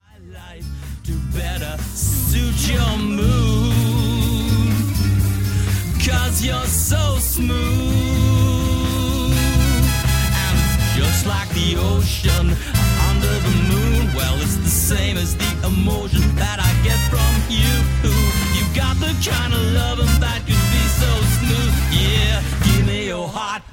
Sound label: smooth